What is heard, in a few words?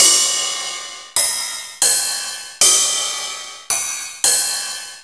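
Roland Tr-909 ride cymbal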